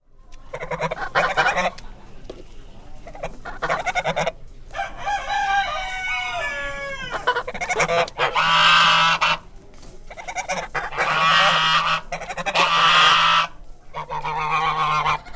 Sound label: American Buff Goose Tone